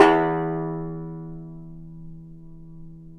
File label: yayli tambur; Spectrasonics; Hans Zimmer Guitars VOL-2